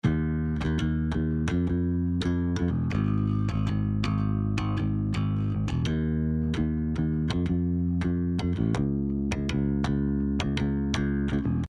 bass before; Rvox

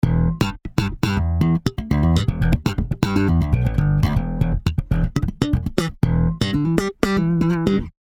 bass before

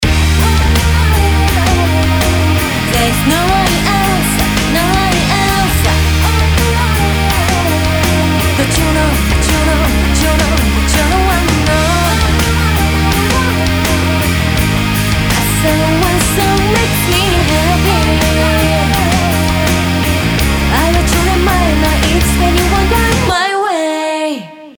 Drum Bus Louder

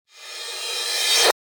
reverse

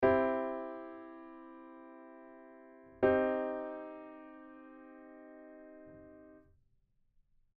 cm to cmin

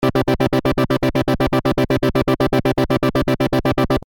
lfo rate

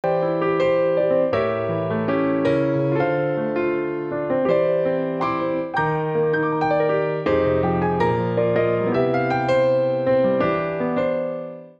piano unlinked

